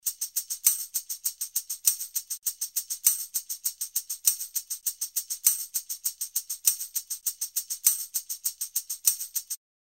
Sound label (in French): TAMBOURIN